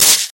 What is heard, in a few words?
bolt fire